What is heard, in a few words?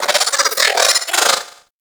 Riot Moa Vocal Attack; Warframe